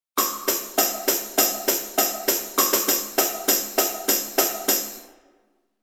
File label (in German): rhythmischer akzent; songwriting